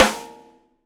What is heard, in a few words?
snare, gretsch kit